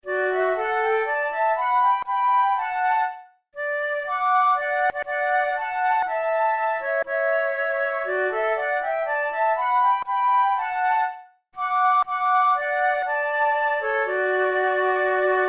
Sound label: duet